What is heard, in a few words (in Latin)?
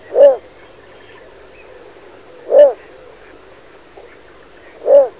Ixobrychus minutus; Волчок